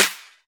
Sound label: Claps & Snares